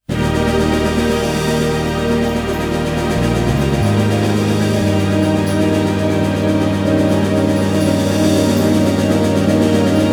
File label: Final Match Goal Rush BGM